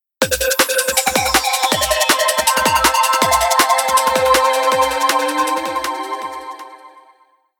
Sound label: Tunes of Warble; Panasonic; Eluga X1; ringtones